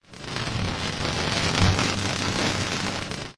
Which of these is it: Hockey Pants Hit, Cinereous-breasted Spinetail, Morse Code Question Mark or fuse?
fuse